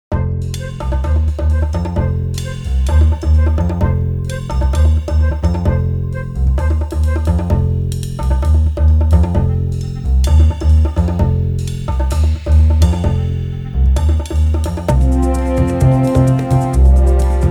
Warehouse (Bongo Ver), 예능 Tension

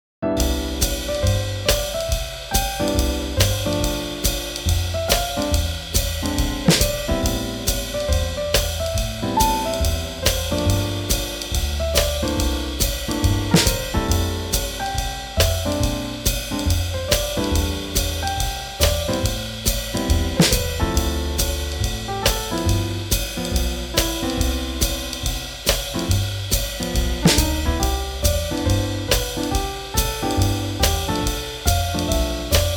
Swing Jazz

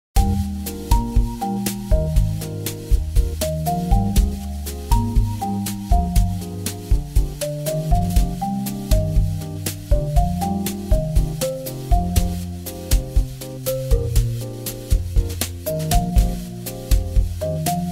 Bossanova; 잔잔

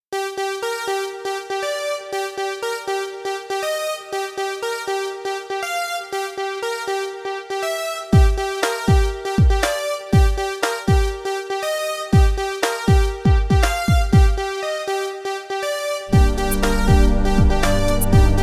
Electronic, EDM

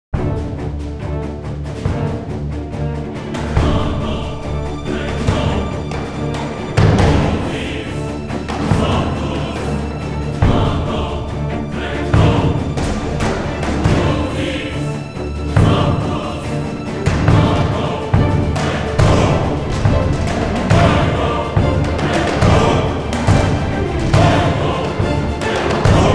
Sus Choir Orchestra, Tension

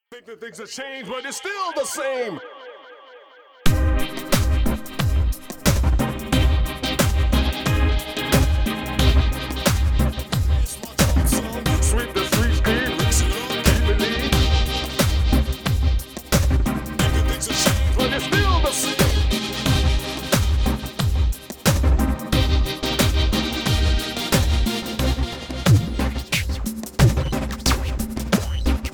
Dub; Hiphop

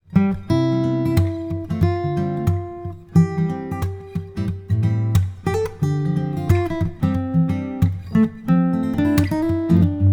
Gentle Breeze, Bright Folk

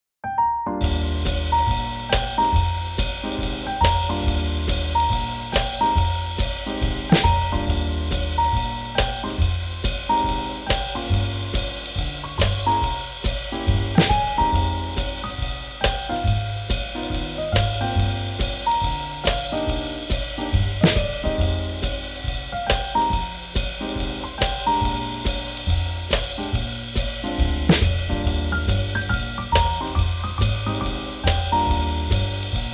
Swing Jazz

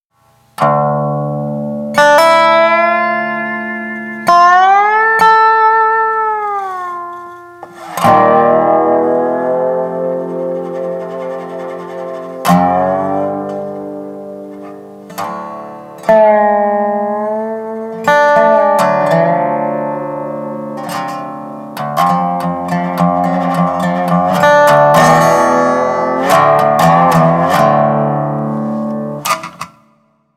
Dobro FX

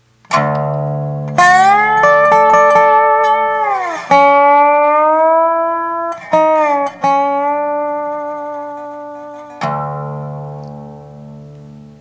Dobro FX

